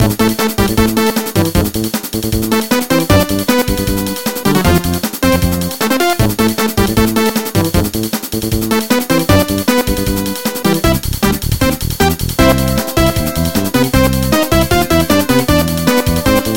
Ocean Music

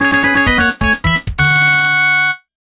Game Over

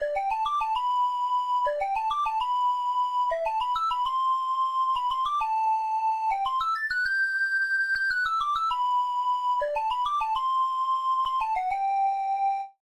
Flute Ocarina

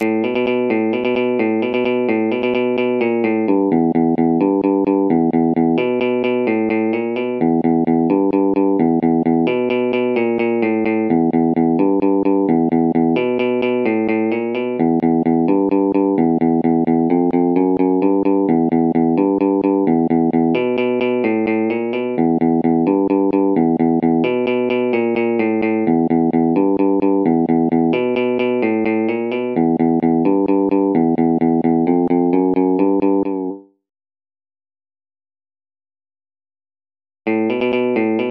electric guitar; TF2 theme

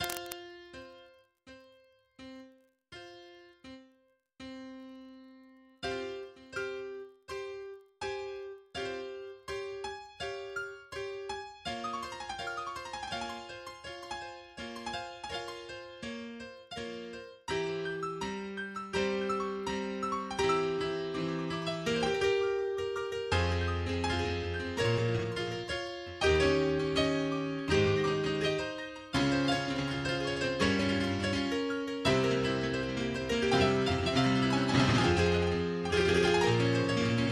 Piano

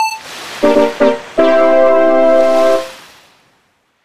Startup Jingle; Widoes7

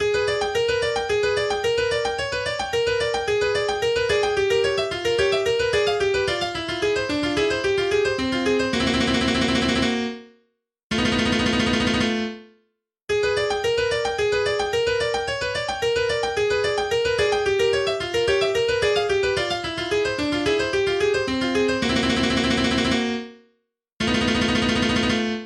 Cave Music

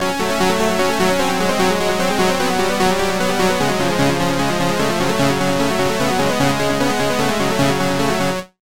Boss Music D

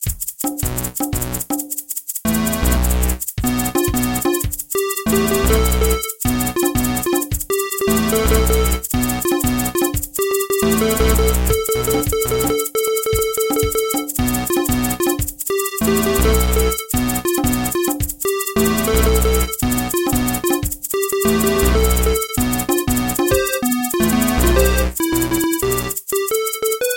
Bouncy Bouncy

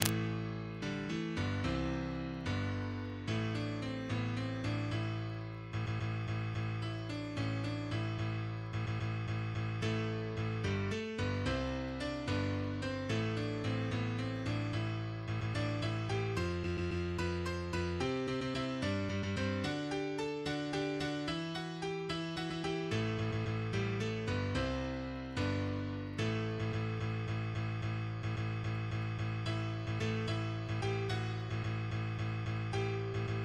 Main Theme Piano Ver; Assassin's Creed IV: Black Flag; Assassin's Creed IV: Black Flag Main Menu Theme (Piano Ver.)

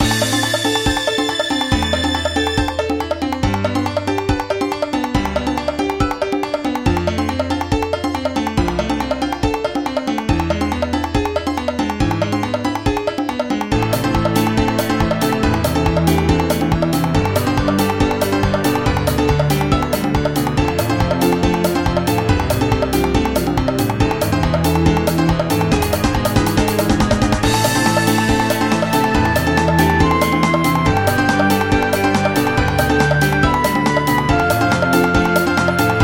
Drummy

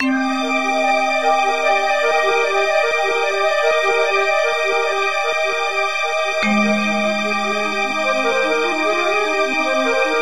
Remix

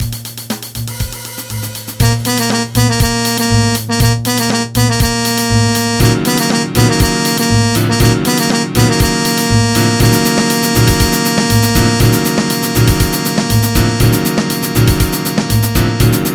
Jazz E Tone

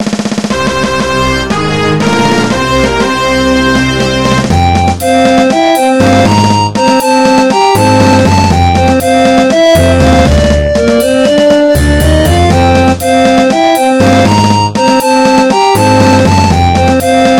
Victory Fanfare

